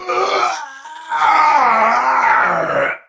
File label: Death; Warframe; Tau; Dax; Ashigaru